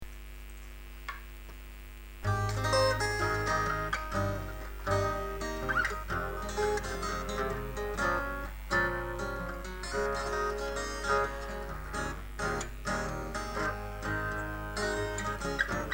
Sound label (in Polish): trzy gitary